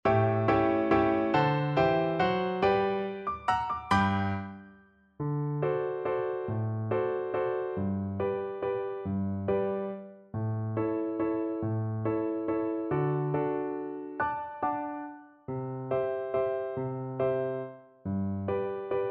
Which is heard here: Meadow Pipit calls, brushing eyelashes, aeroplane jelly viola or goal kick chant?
aeroplane jelly viola